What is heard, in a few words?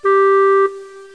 WOOD